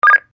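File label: FleetSync Sidetone, radio sounds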